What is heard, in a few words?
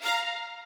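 violin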